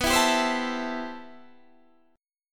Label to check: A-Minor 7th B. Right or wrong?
Right